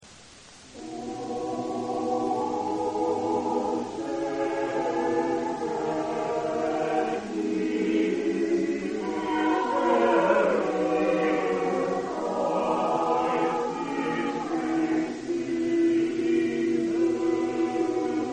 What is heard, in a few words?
anthem offertory